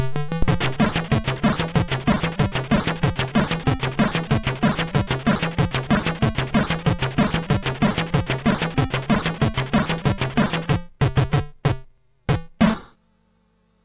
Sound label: tune, MOS6581R3; Aaaargh